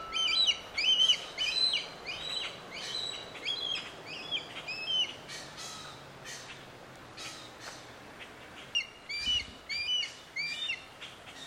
Red-tailed Hawk, Buteo jamaicensis; raptors

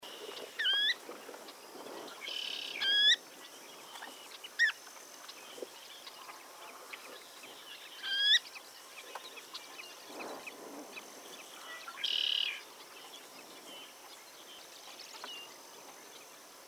Stilt Sandpiper, Calidris himantopus; shorebirds rails

